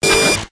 medi shield burn, weapons; tf

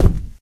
cardboard, player footsteps survivor walk; source engine; left 4 dead 2

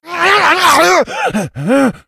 rage at victim, infected, male

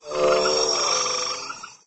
jungle error; windows 9x; sound schemes

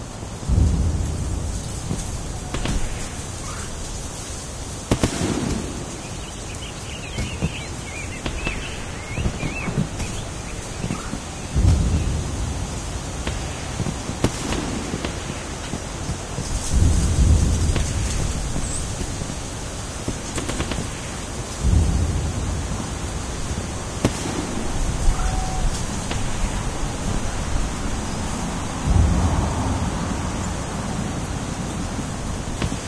forest ambience; Source Engine; dod

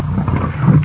ag idle; agrunt; video games; source engine; bshift

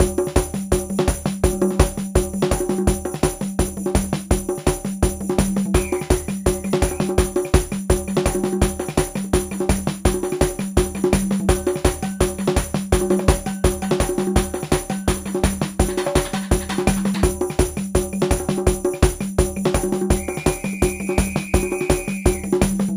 conga sketch 167bpm; music; source engine; tf; video games

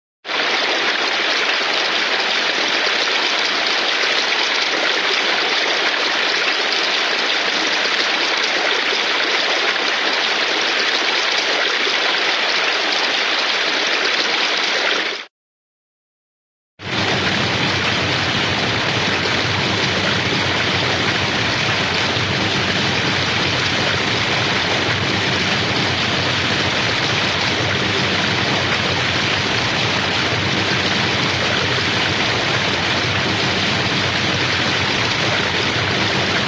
water, falls small waterfall; cartoon

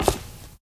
black tile, footsteps

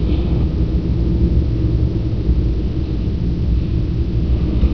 under, ambient tones; Source Engine; cstrike

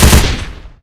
sentry shoot, weapons; tf

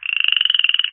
frog; Source Engine; cstrike

